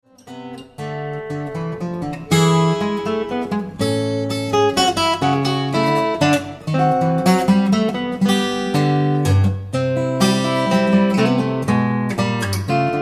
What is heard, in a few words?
Waltz